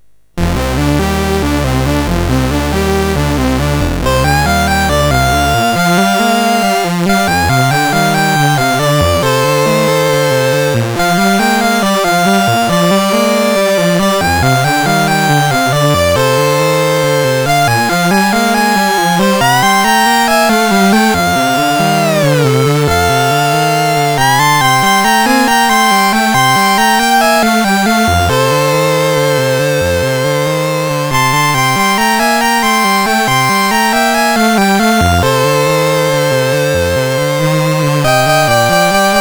Blues, MOS6581; MOS6581R4

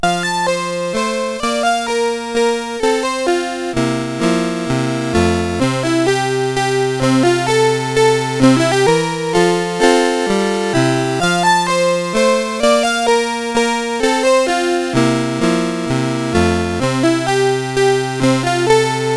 Minute in F Major, CSG8580; CSG8580R5

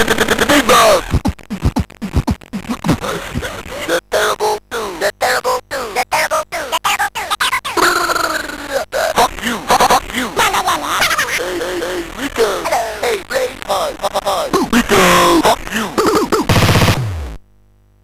Beat Box, MOS6581R4

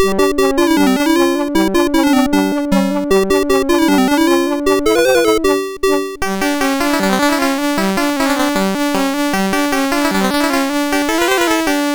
sid MOS6581R4

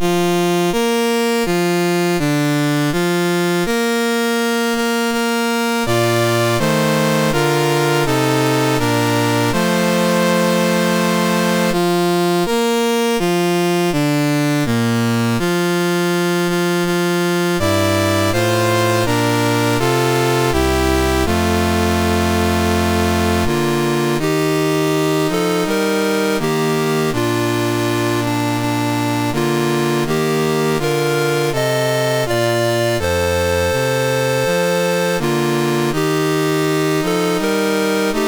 Australia, National Anthems, MOS6581R3

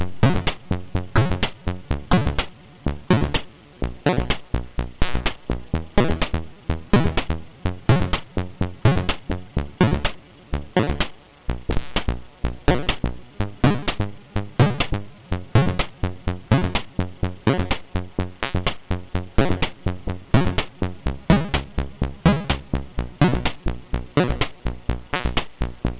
Electronic 256 bytes, MOS6581R2

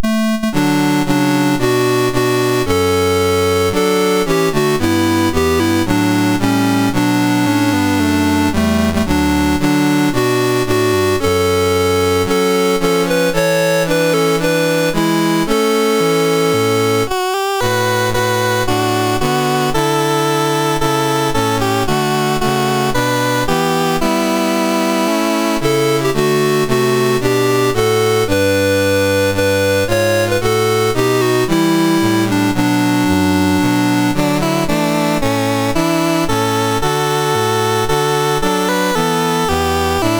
MOS6581R3, National Anthems; South Africa

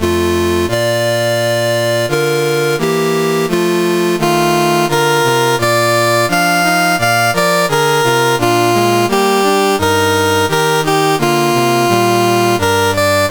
CSG8580R5, National Anthems; Saint Kitts and Nevis

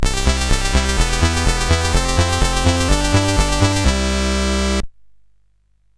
sid, CSG8580R5; Clue-Master Detective

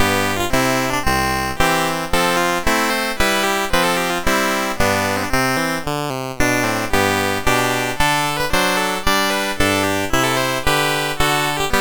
CSG8580; CSG8580R5

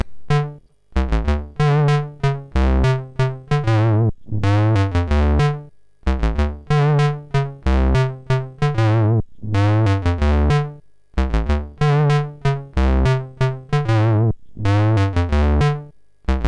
Birthday Sound, MOS6581R2

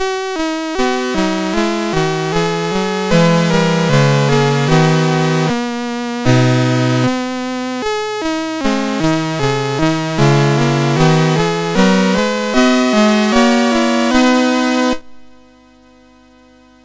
sid MOS6581R2

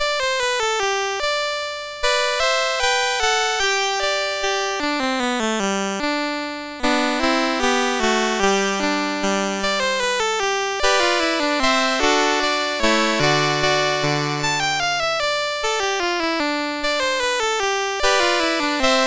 Toy, MOS6581R3

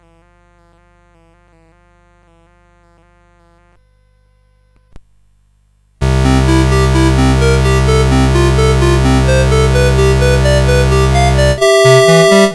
Little Prelude in C Major, CSG8580R5; Lord Myrkul

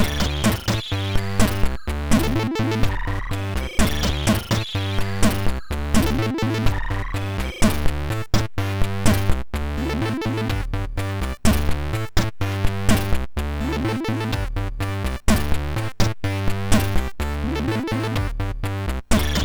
Loop; Mojo

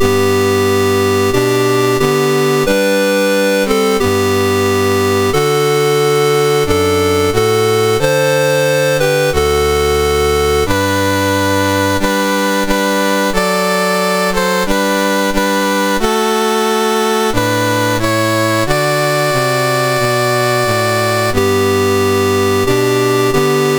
Latvia, National Anthems, MOS 6581

